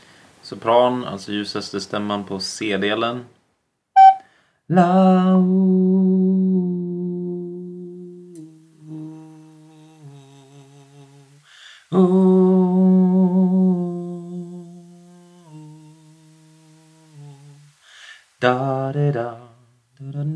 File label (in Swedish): Sopran C